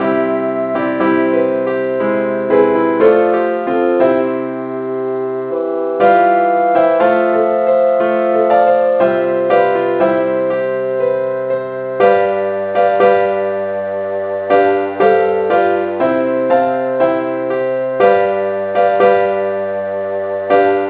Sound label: keyboard key f